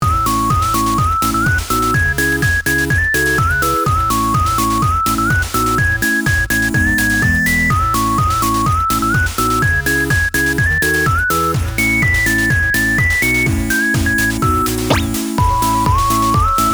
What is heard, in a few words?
kawaii 8bit